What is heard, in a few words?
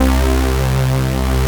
K2000 FAT, BASS; SoundFactory